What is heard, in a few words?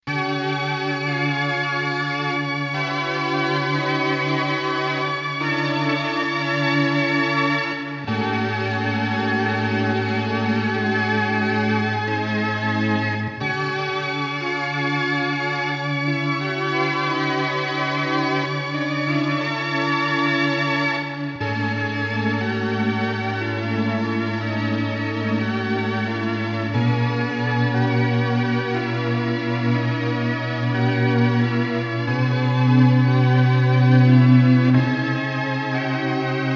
Vox Continental; arturia